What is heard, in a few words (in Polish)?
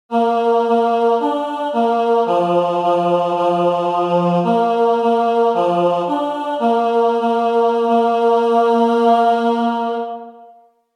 Sygnal mysliwski Wicekrol polowania